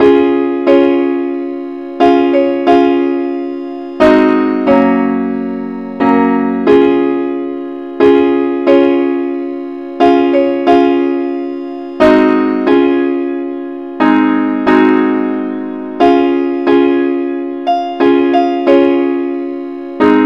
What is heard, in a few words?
simple acc, key cis